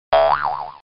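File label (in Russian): icq oshybka, ICQ error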